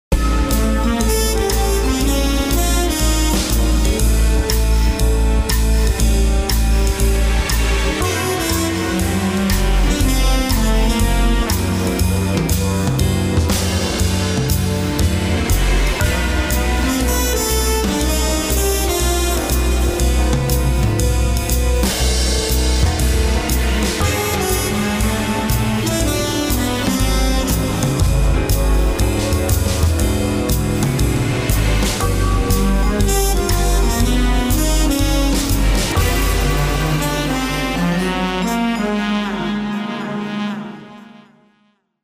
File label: Closing Credits Theme Super Locrian Scale; CITY LIFE; Closing Credits Theme 2